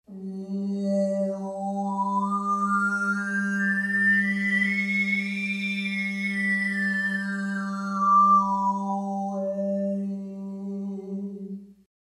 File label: Overtone Series